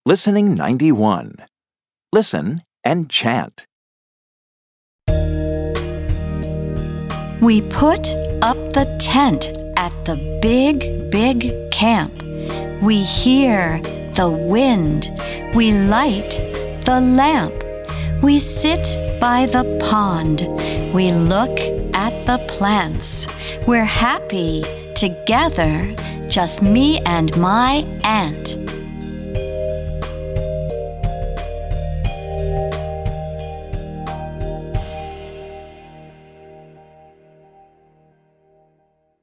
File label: Chant; Family And Friends; Family 3